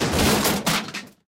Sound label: place, battleship